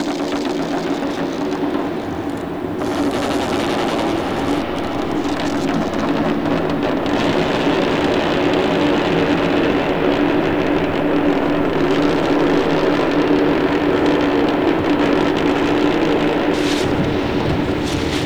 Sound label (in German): Space Sound